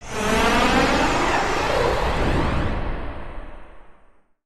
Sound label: Camera to rocket; Skylane; missions